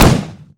handheld howitzer fire

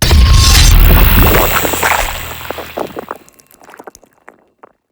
battlefield gun moment; cinematic; crucible

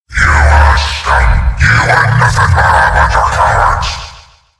dreadnought summon

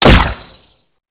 actor death

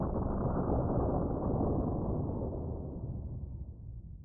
spooky ambient sound

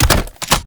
shotgun pump reload, weapons

